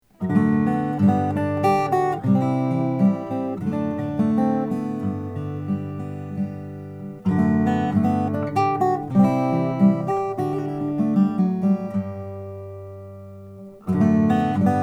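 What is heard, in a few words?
lowden custom f50c, guitars; custom africa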